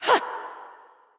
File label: grunt jump; smite; awilix